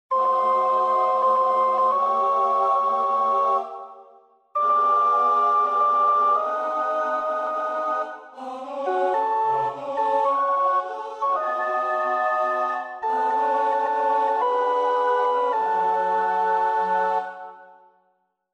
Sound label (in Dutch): alt 2, koorpartij oefening; Bruch (Max); Moses op.67